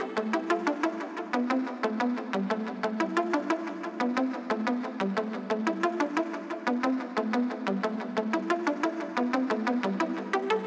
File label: Echo Sequence 4 Bars; Vostok Inc.